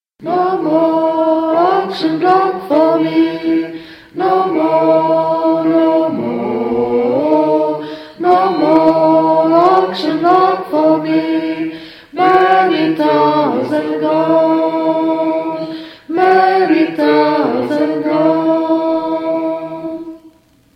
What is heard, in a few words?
No more auction block soprane